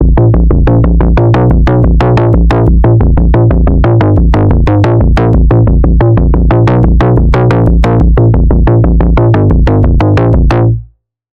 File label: Pulse, Sylenth1; Pulse - Time Bomb; Routed Sound; Sylenth1 Expansion - Quantum Enforcer Vol.1